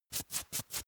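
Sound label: makeup stroke apply sequence fast, bathroom; haus-garten; badezimmer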